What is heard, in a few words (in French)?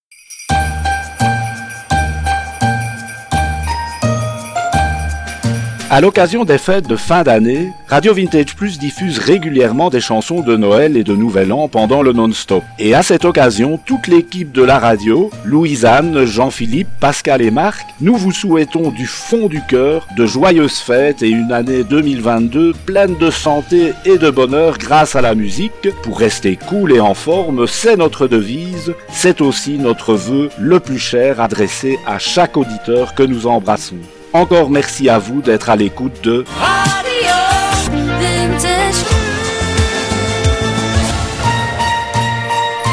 Jingle equipe et chansons de Noel jeu